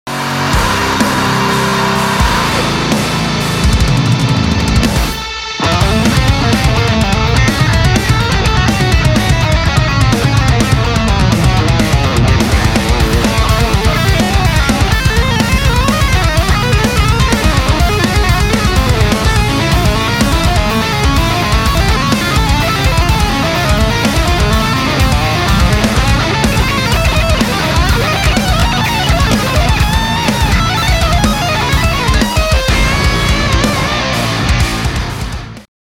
assolo, guitar

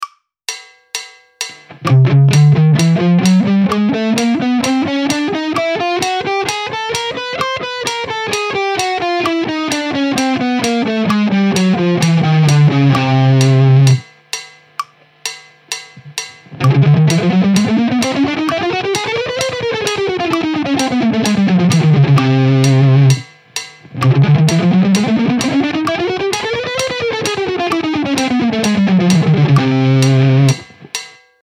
Scala cromatica ascendente discendente

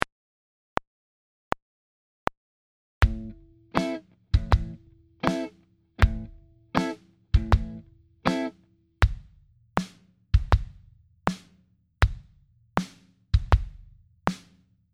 cassa e rullante groove

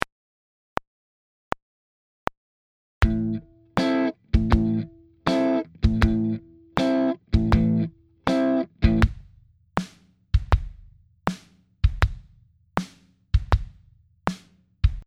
cassa e rullante groove

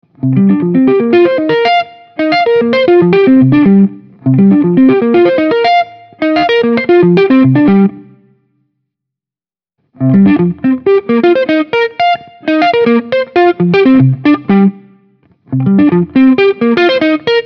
Esercizio Hybrid Picking Si bemolle, guitar

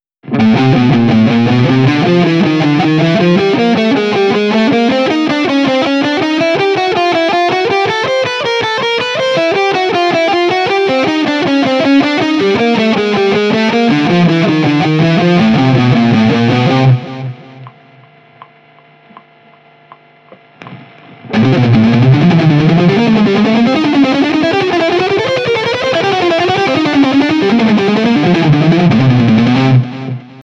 crom corde adiacenti

